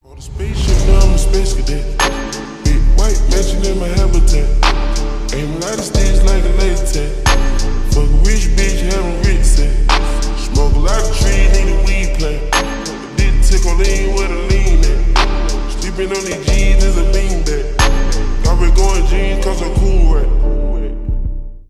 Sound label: slowed reverb